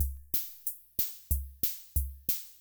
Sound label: Kay R-12 Rhythmer Loop, Drum Machines